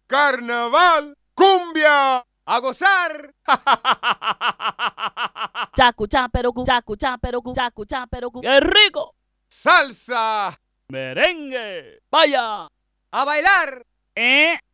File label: vocals, emu carnaval